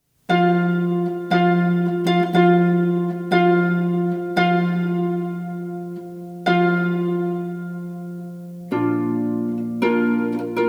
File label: Bridal Chorus Here Comes the Bride